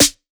snare hairy; Hip-Hop; Drums; Drum One Shots; Slate; CAICOS Hip-Hop Sample Pack

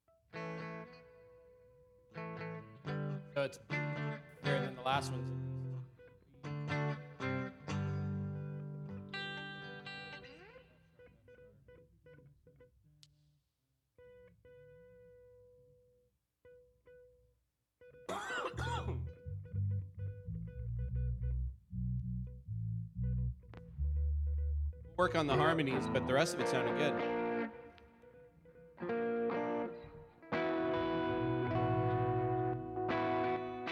Vocal Emphasis Mix

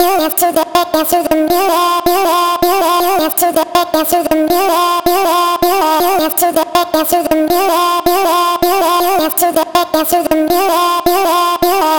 Vocal chop, 160 Eb; Dance; Slate Digital Omnivox Vocal Sample Pack; Chops

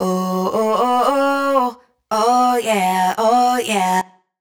riff Oh Yeah Oh Yeah 115 F#m wet, Vocal; Slate Digital Omnivox Vocal Sample Pack

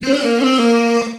phrase Bb, Vocal

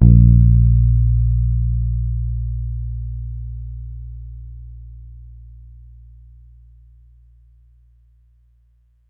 Bass Bb, Electric Bass One Shots; Slate Digital BASSHOLE Sample Pack

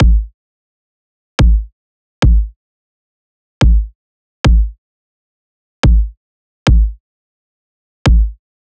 kick loop 108; Pop; Drums; Loops; Slate; SD PASADENA Pop Sample Pack

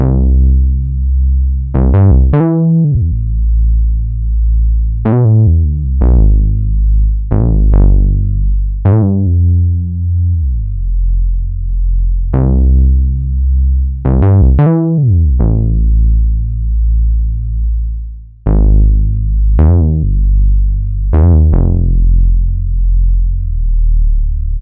Synth loop 78 C, Synth Bass Loops; Slate Digital BASSHOLE Sample Pack

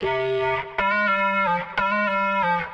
Vocal Sample Pack Phrases Cm; Slate Digital Omnivox Vocal Sample Pack

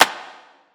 clap one shot room, Pop drums; Slate; SD PASADENA Pop Sample Pack

